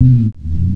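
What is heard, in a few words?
Bass Riff Bass, Loungin House; Equipped Music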